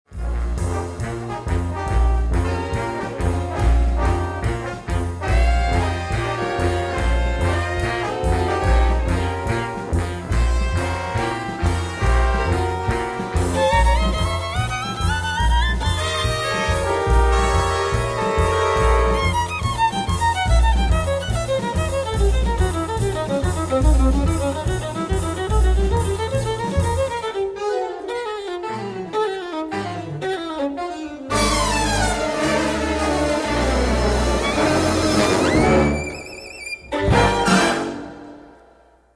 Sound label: Concerto for Violin